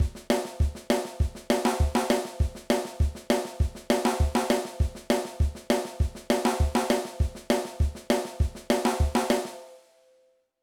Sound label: Train Groove